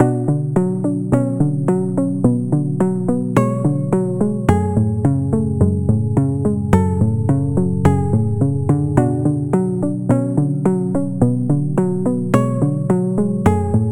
Key, Sylenth1

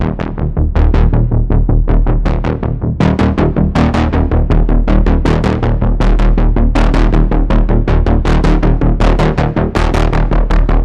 Pulse; Port; Routed Sound; Vital Expansion - Quantum Enforcer Vol.1

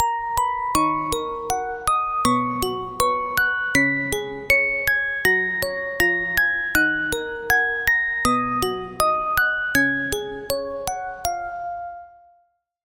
Bell - Music Box; Routed Sound; Serum 2 Expansion; Story Walker Vol.1

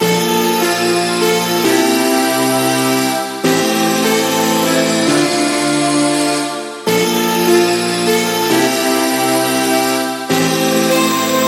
Lead; The Calling; Routed Sound; Serum 2 Expansion - Flame Summoner Vol.1

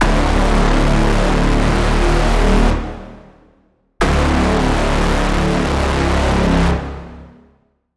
Braam; Protagonist; Routed Sound; Vital Expansion - Quantum Enforcer Vol.1

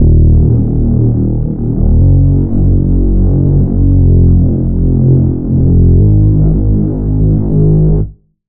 Reese; Gunpoint; Routed Sound; Vital Expansion - Noble Gangster Vol.1

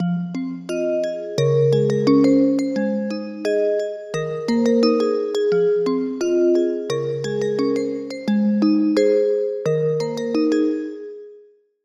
Bell

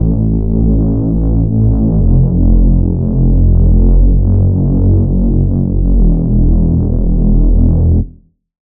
Reese; Abandon; Routed Sound; Vital Expansion - Noble Gangster Vol.1

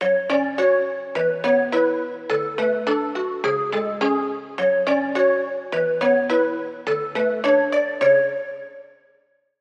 Key; Routed Sound; Serum 2 Expansion - Story Walker Vol.1

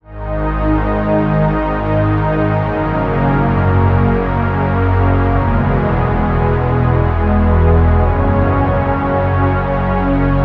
Pad; Routed Sound; Sylenth1 Expansion - Reality Maker Vol.1

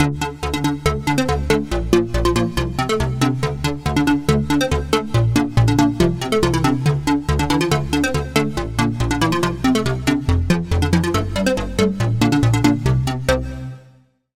Pluck; Routed Sound; Sylenth1 Expansion - Hyper Gamer Vol.1

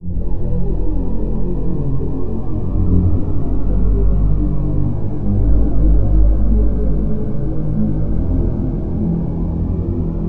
Pad; Routed Sound; Spire Expansion - Noble Gangster Vol.1

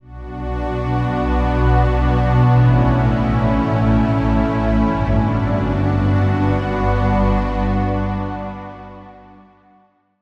Pad; Routed Sound; Spire Expansion - Reality Maker Vol.1

